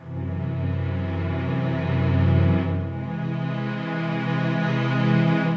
Hip Hop and R&B Drumloops

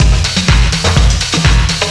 duststorm, Deep House 125bpm

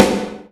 CELLAR, SNARE

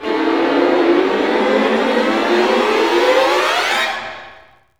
STRING GLISS; Roland - LA Composer vol. 1

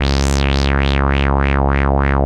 WAH WAH C#2; CMP - Analogon

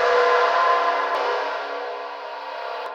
noisepan; Sonic Foundry (Sony Creative Software); Ugly Remnants VOL-2; UR 2 FX (Long)

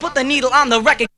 VOX; Zero G; Man Machine

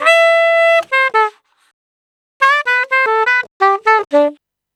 Sax 100bpm C, Saxaphone; Quazibeat; African Secret

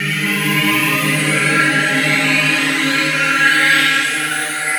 Phase Choir; Sonic Foundry (Sony Creative Software); Myths of Technology

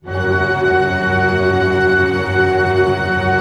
TREM F# PP, ORCH TREM; Sound On Sound - Peter Siedleczek's Orchestra

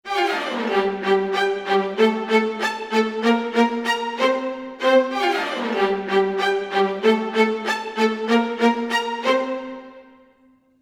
95 strings hi+mid, Gmin; PowerFX - Downtown Orchestra

